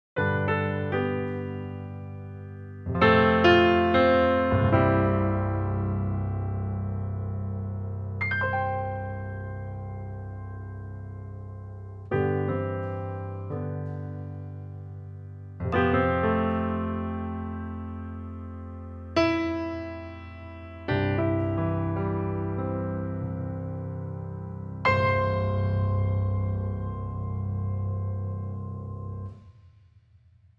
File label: Studio Steinway B, piano; EZkeys